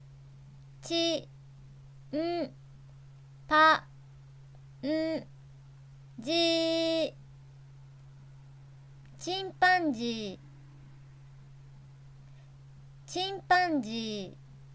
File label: chimpanzee